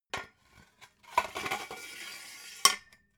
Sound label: metal scaffold pole retract hard; industrie-wirtschaft